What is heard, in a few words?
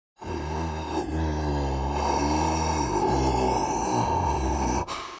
walk; Voices Golovan